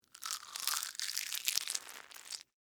Tear Rip Slow Egg, Texture, Tear, Rip, Slow, Egg, Shell, Open, Vegetable; Ripping; BlastWave